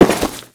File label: Crewman Step Default, Footsteps; Warframe; Corpus